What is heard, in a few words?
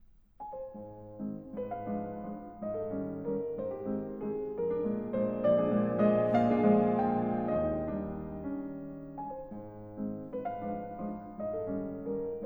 Valse Allemande; Carnaval Op.9